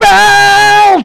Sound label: belts, Voice